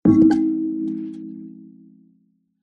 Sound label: karimba down on soft surface; musical